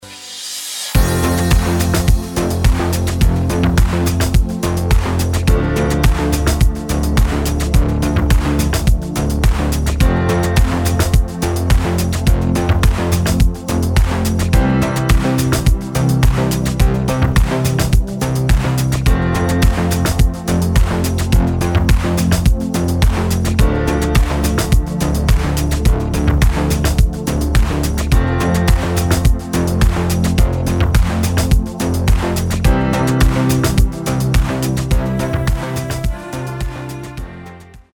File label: Emotive Electronica